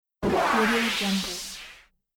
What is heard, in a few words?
whoosh swoosh woosh; jorgepires